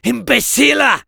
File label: Medic negative vocalization, fr